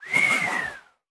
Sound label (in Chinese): 空中待机声; 旋风人